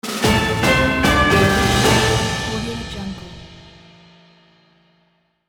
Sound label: orchestral breaking news jingle ident